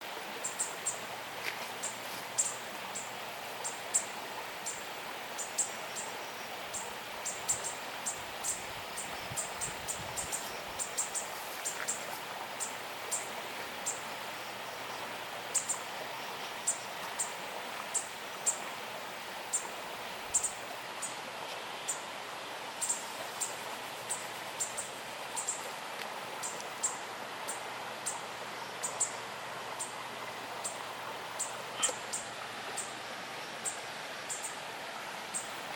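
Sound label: Purple honeycreeper, bird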